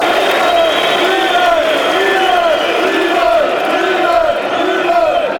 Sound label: fanchants, sudan; lehmann